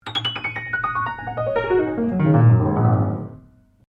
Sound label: PIANO UNTUNED RUN, Baby grand piano left to fall out of tune; Pianos; Musical